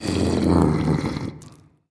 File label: zombie pig